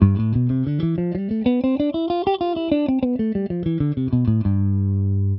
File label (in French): Sol Mixolydien, Guitare; Mode Mixolydien à partir de Sol : Tonique à la 3è/15è case; Sol La Si Do Ré Mi Fa